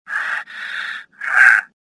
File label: gasp; worker1